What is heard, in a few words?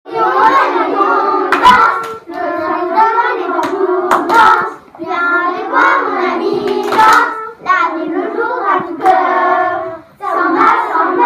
Samba